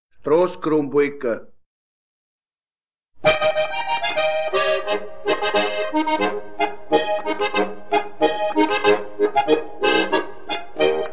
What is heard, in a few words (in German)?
polka